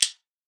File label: Click Metal